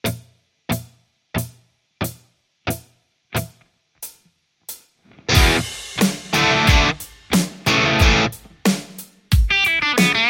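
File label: Telecaster JCM800; mercuriall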